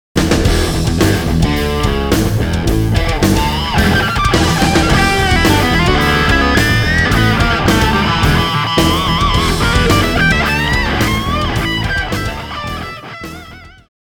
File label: Riff, guitar